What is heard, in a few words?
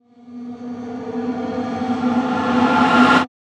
ghost whisper swell; soundscrate